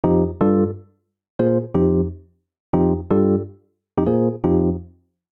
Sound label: F minor 88bpm; MIDI Clips; Accompaniment; Rcrd Plnt; Ableton; Factory Packs; Chop and Swing